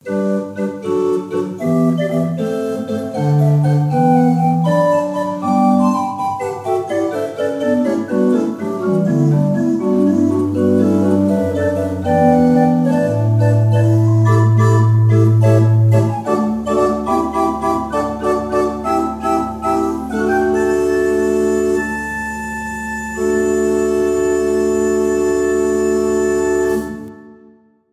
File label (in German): Oehms 6 II P, orgel